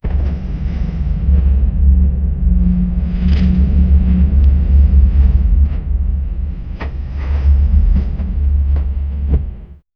heavy footsteps on metal, ship

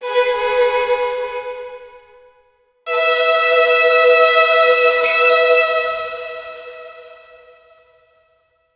ancient strings plucks of

